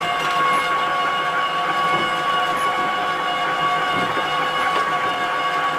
Forklift Motor Sound; Mafia 2

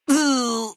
Generic robot hit; Fallout NV

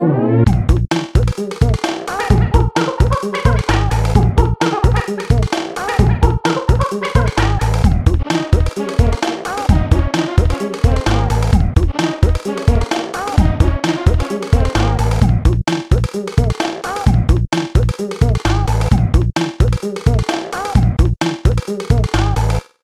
Diddy Victory; K. Rool

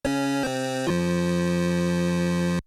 unused song; Arcade 4 Defender Jouse

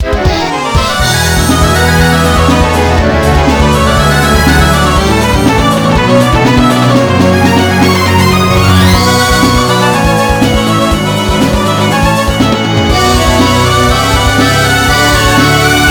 BGM RESULT; Awdor

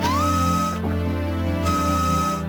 star door sound; SM64DD

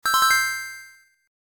Systemsfx unused

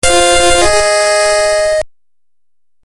Fanfare

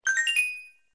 Coin Collect; SMS Early

